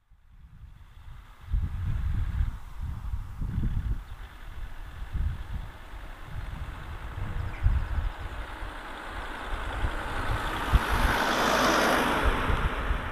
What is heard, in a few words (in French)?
Voiture